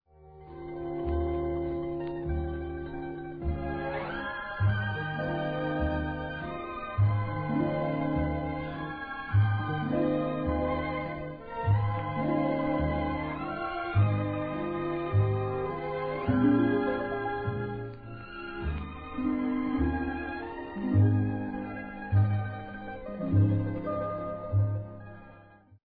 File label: Blues; No Sad Songs For Me